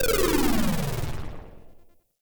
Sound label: Arcade Misc; Warframe; Ambience; Nineteen Ninety Nine